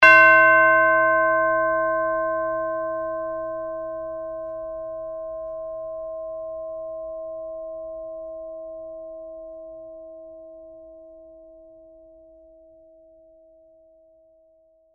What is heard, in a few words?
Tubular Bell Hit; Bell Sound Effects; Bells (Sound Effects:Musical); BlastWave